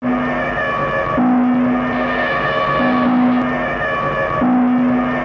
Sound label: Crude Tape Loop, Tape delay; radiosonics